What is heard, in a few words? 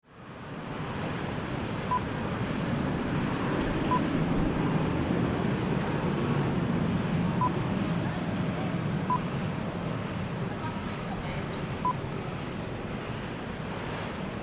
Heathrow Outside Terminal 3